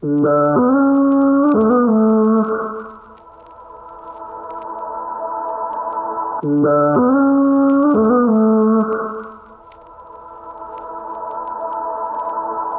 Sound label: vocal chop, 150, D; Soothe; Slate Digital Omnivox Vocal Sample Pack; Chops